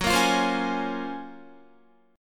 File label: F sharp Major Sharp 11th F sharp, Guitar; F#M#11 Chord; strummed; 13,13,17,17,13,16